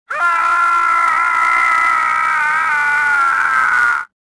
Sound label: falling, swamp